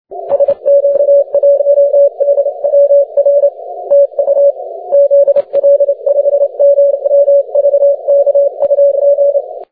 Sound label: 30m